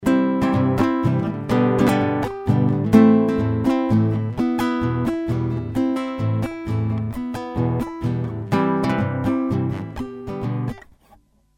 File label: Test Takamine Firebox